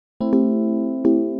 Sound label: house synth 125 A, keys